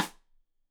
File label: Side Stick Stereo; Deeply Sampled Drums; SMDrum